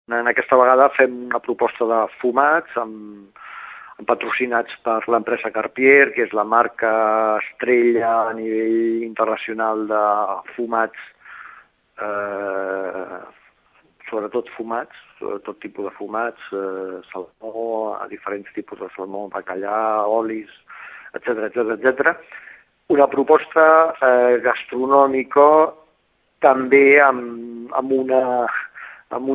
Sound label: jazz